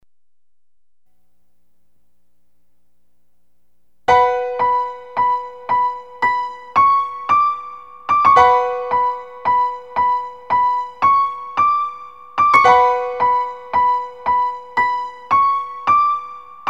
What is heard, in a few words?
piano